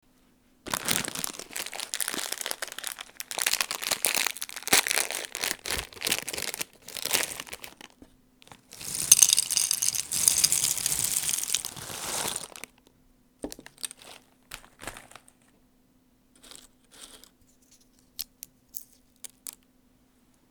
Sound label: bag of pistachios